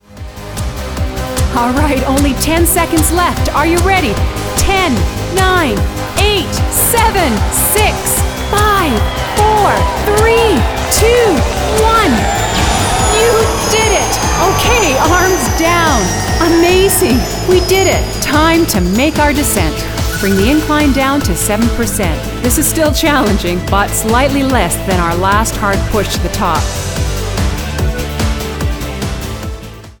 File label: Cardio Workout Nature Walk